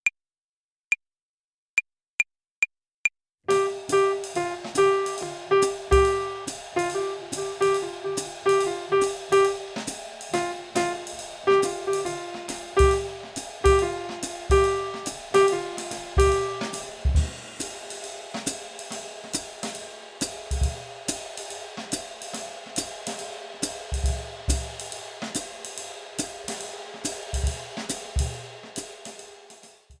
Swing-Phrasierung Übung, Play-along, Klavier; Jazz Club Klavier

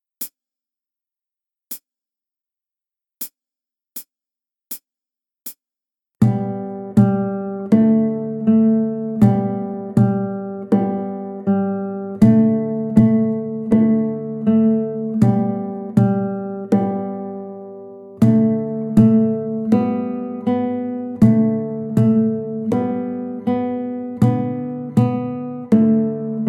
Panama und Afrika, guitar; Play Guitar Together 1

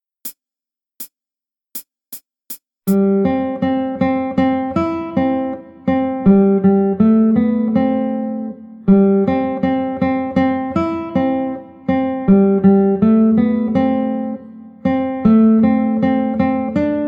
Lied vom Anderssein, guitar; Play Guitar Together 1

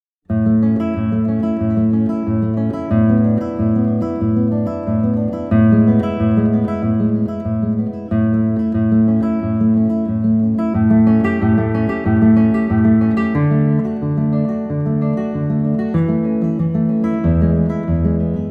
Prelude A-Dur, guitar; Play Guitar Powersteps 1

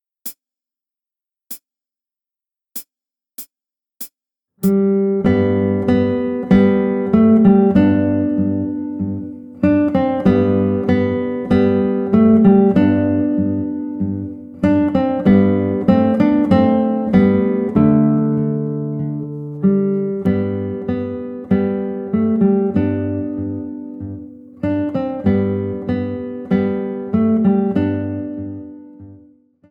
Der Frühling, guitar; Play Guitar Together 1

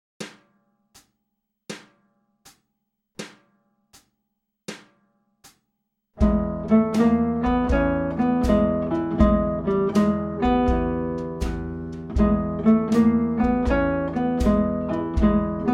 Gitarrenschule; Gitarrenschule Band 2

